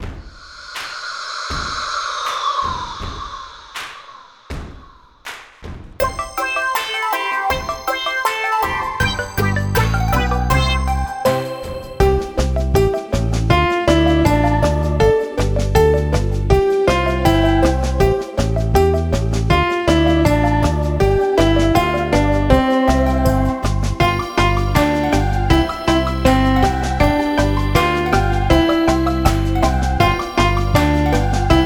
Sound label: karaoke